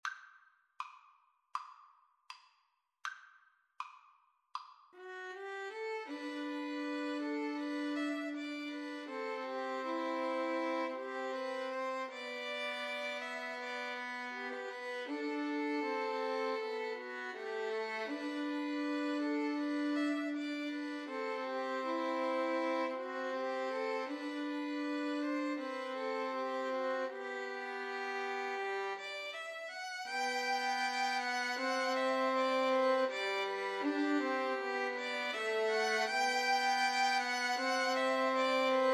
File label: 2 Violins Cello